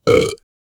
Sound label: burping after eating